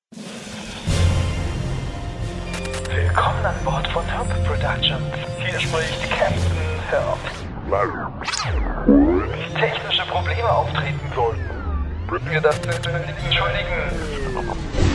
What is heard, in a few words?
Scratch